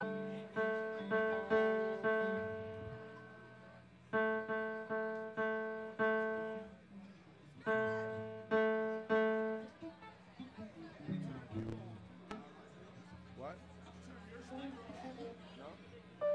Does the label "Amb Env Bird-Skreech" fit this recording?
No